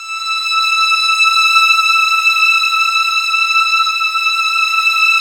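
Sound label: Violin Ensemble; Piano Layer, Rds Str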